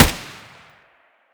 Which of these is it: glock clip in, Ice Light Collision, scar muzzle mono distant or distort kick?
scar muzzle mono distant